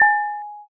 tear

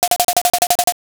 lever

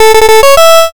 fanfare

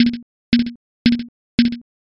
fs gym

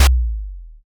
taiko

